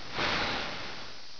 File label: hiss